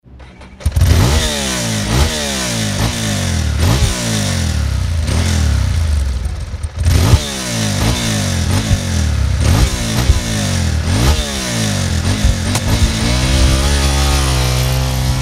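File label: Ducati 998 sprint; moto; Ducati 998 - passage 1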